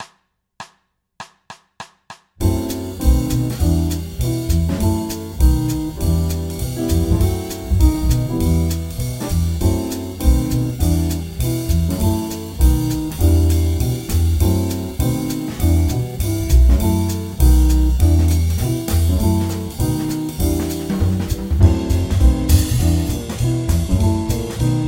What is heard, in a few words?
F#m7b5-B7-Em7 loop; 2bars Loop